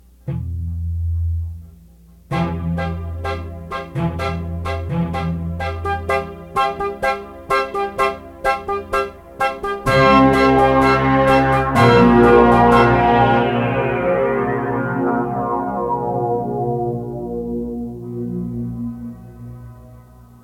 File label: Synthesizer parts